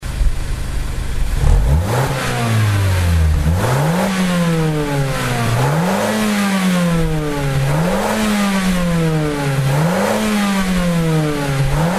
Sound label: Mazda CX3 DK SkyActiv Muffler Audio; AutoExe